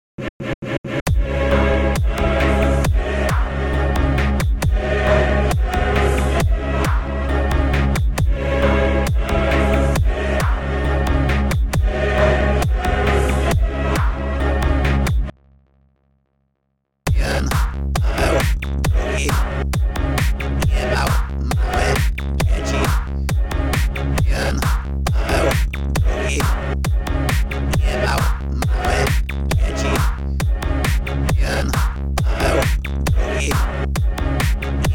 House

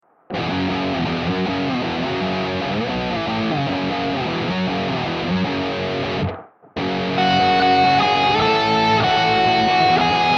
RIFF

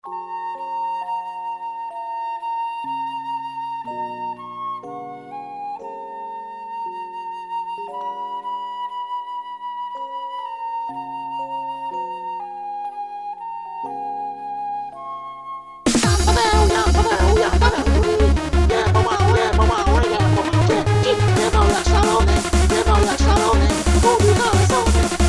jingle bells

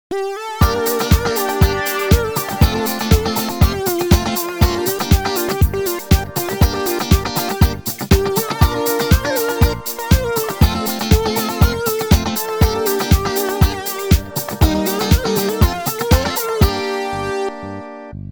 barka disco

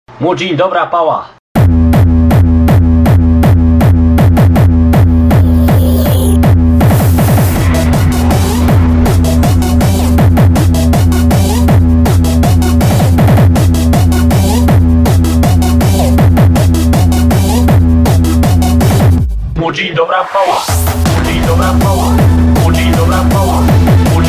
Techno

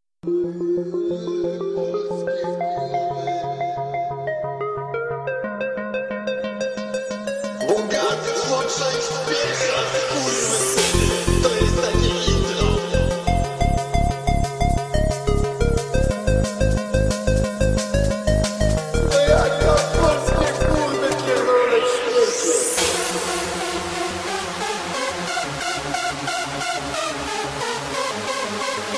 Happy Hardcore

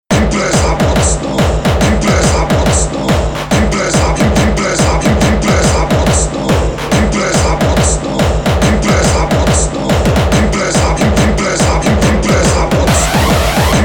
hardstyle

